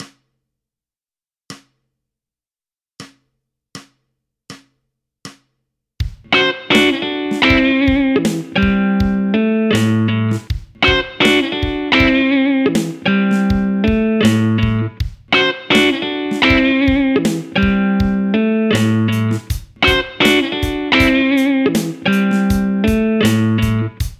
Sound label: Blues Riff A 80 BPM